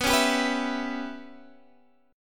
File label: C sharp Minor 7th Flat 5th B